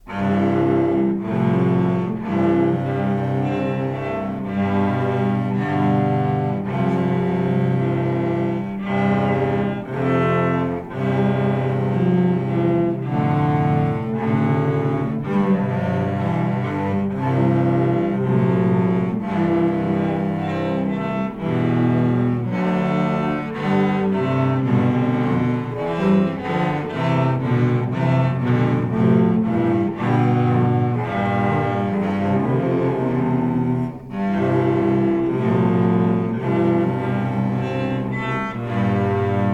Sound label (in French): QUATUOR VIOLONCELLES; CONCERT RADIOPHONIQUE A L’AUDITORIUM XENAKIS DE STAINS